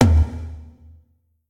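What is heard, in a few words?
Drums Tom; smite; raijin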